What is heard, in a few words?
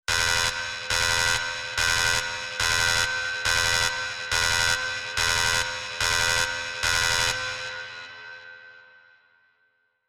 evacuation alarm